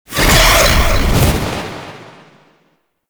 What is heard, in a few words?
Boreal Teleport Out; Warframe; The New War; Archon; Abilities